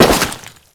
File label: Crewman Step Goo, Footsteps; Warframe; Corpus